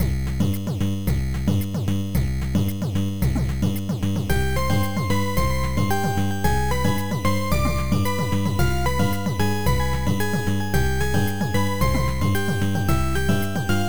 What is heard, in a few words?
Surf; Planet E; bomberman 93; turbografx 16; pc engine